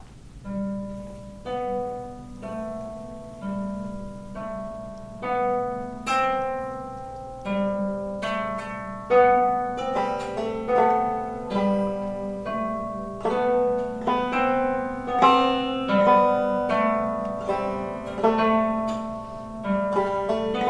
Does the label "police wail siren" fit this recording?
No